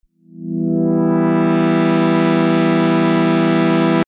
synth filter

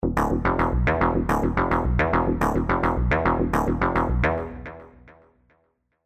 FM Bass

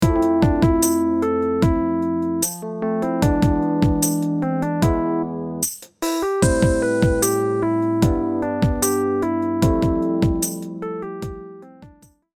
Fmaj7 E7 Am7 G Fadd9

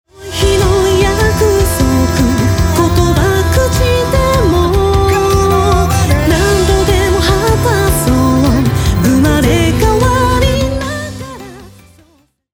vocal

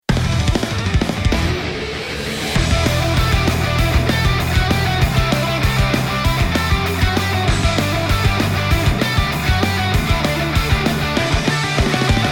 bass

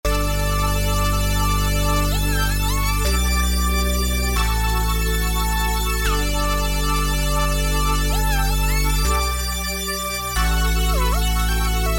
Future Bass

